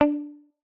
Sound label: pluck